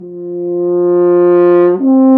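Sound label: brass, F.Horn Swell; F.Horn FX; Intervals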